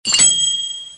kyupin; anemoi

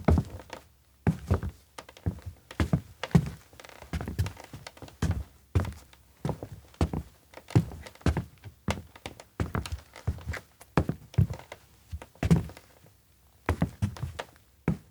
steps indoor creaky school walk; rikka; anemoi パッケージ版 1.1

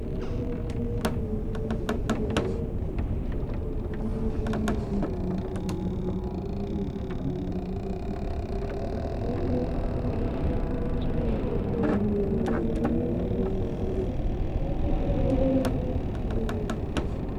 wind in room; anemoi

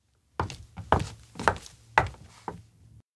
steps outdoor lakeside enter; hina; anemoi

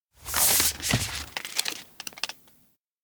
shelf sketchbook take flip; anemoi

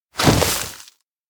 dive into hole upper; ken; anemoi

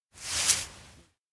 release kite; aino

